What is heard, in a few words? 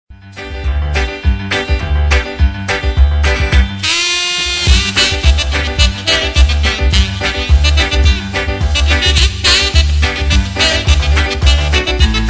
Sax